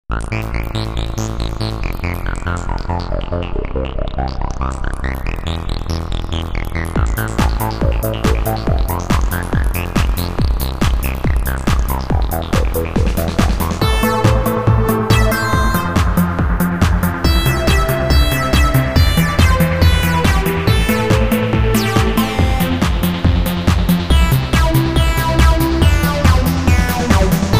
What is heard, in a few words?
Techno